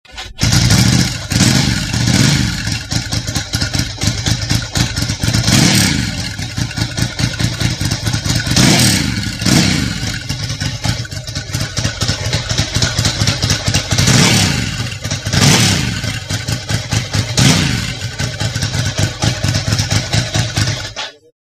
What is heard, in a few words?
staintune, Ducati